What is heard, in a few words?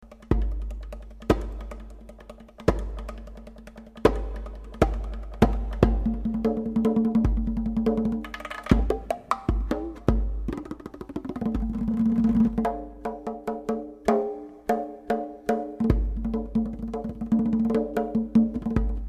Improvisation